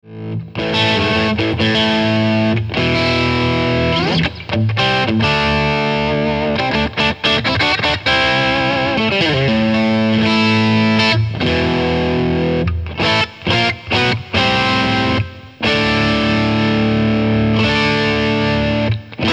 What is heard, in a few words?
Overdrive, Cyber Deluxe